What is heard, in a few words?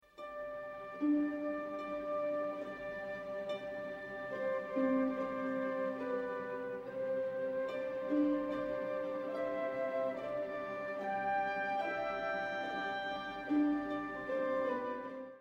khaeklop